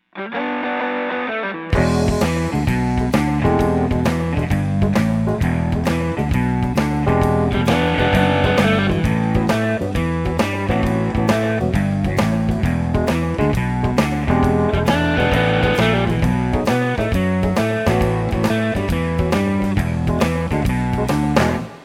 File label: rockin blues